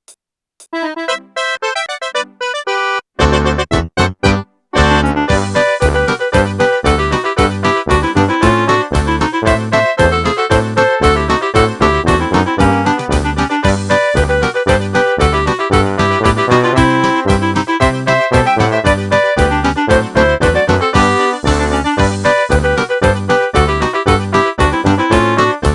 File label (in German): Krampustanz GM MIDI